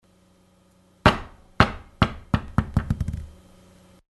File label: Ball Bouncing